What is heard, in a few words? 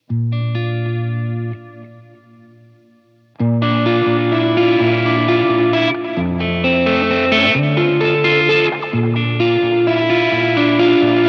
guitar; Cicada → Amp → Delay → Verb